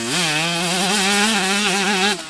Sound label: high, Engine